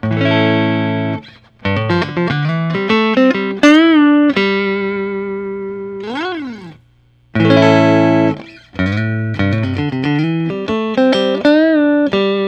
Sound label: fender custom shop master built; greg fessler